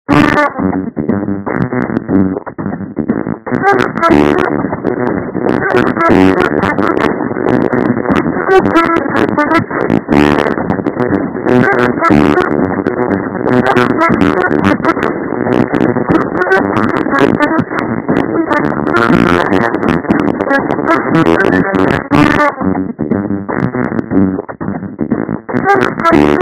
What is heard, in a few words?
Portal radio